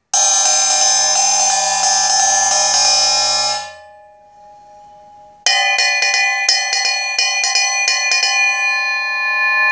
14 b8 bottom

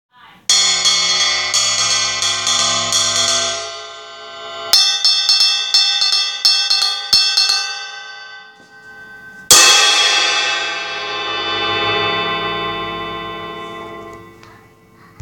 Paiste Rude Crash Ride

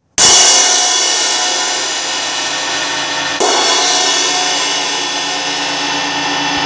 Paiste 2002 crash

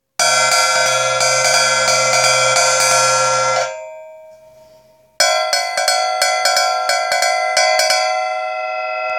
Solar 14 top

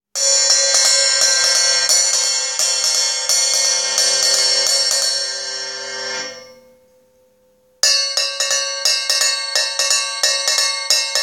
14 XS 20 Bottom, drums

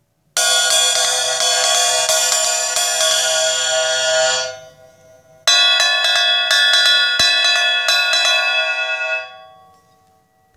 ZBT Top, drums

